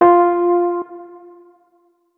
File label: notes, keyboard, electric piano; Hideout Furniture